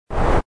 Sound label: air swoosh; FlashChat v3.931